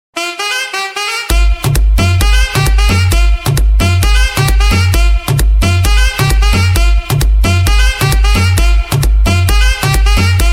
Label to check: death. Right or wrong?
Wrong